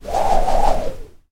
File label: rope swinging swish